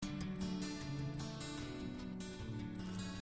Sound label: Picking D,A,Bm,D 75